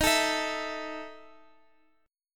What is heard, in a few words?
E Major 7th Suspended 4th Eb, chord